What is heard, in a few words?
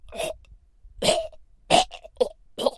Gagging; Batte-Man